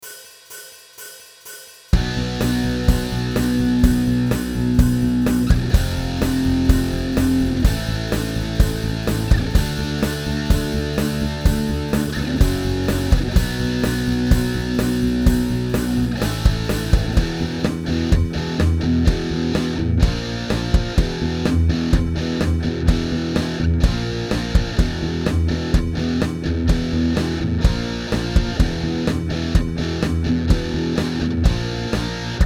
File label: Backing Track